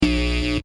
Electric Buzz Burst, Short electrical humm, buzzing; high voltage; Electricity sounds; Electrical Sound Effects